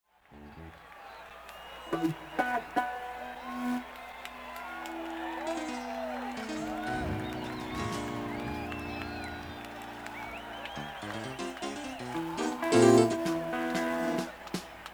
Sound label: Tuning